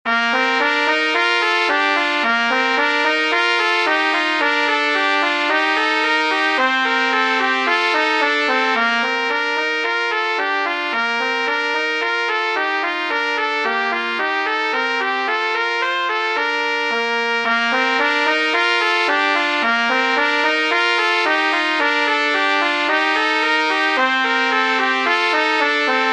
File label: gavotta, bagatelle; hook james